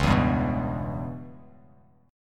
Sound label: Db Minor 7th Flat 5th Db down Bass Standard; strummed; JGuitar